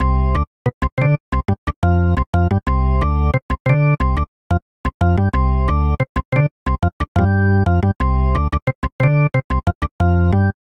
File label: Vamp R&B Organ C Major 90 bpm; Electric Keyboards; Ableton; Factory Packs